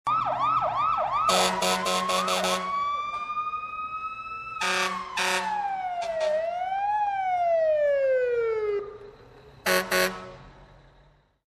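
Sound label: fire siren sounds, air horn sounds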